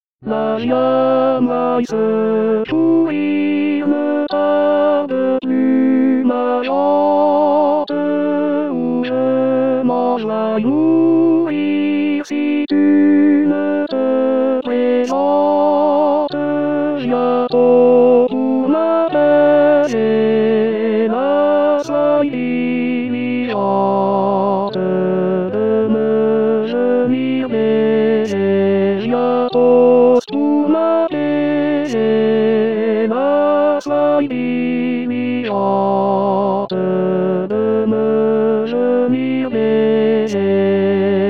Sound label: tenor; rimailleurs